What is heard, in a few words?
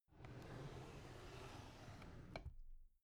drawer cabinet carton close soft